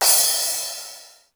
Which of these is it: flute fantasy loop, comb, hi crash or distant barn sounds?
hi crash